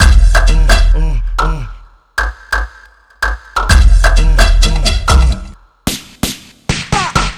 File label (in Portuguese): BEAT PALMA; QUEIROZ